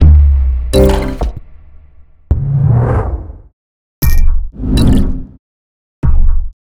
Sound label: scorecard rounds; Monster Jam Steel Titans